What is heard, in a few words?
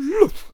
Bard Attack Vocals